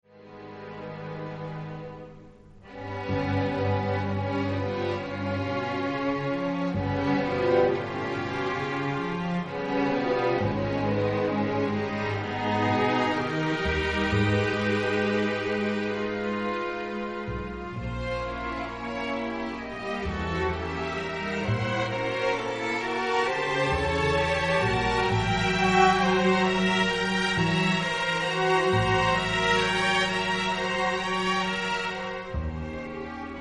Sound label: Ballad For Strings